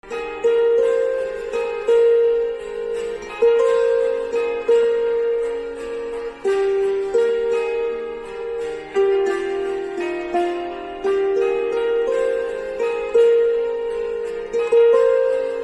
Silently Guitar Ringtone

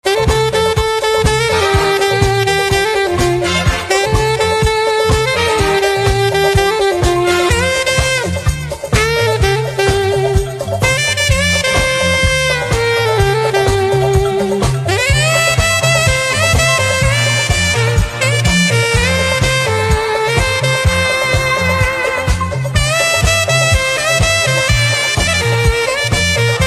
Saxophone

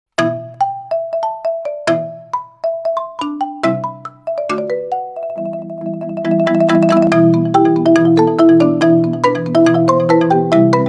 Xylophone Instrumental Ringtone

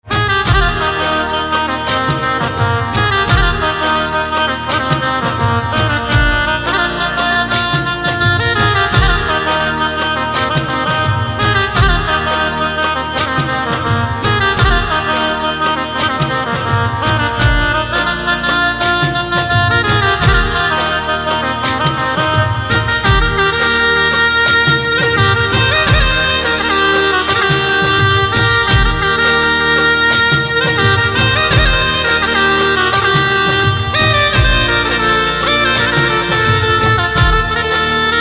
Qawwali Saxophone

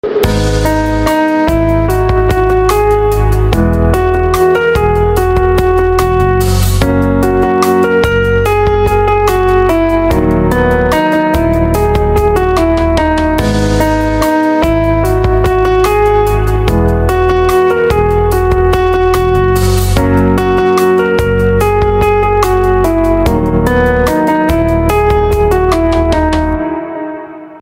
Piano Instrumental Ringtone

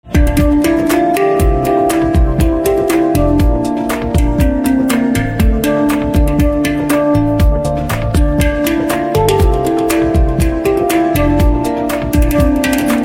Handpan Instrumental Ringtone

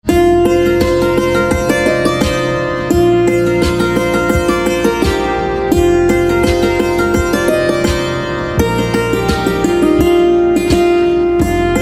Santoor

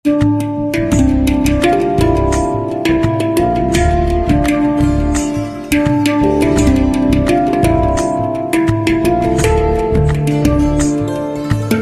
Handpan Instrumental Ringtone

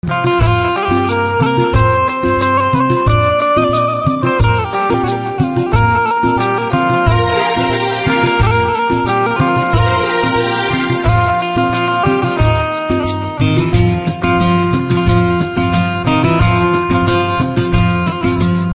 Banjo Instrumental Ringtone

